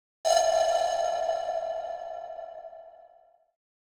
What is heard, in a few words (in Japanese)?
ホラー; 井ホラー